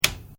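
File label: Switch Oscilloscope Turn, Mechanical switches; Electrical Buttons (Sound Effects:Multimedia)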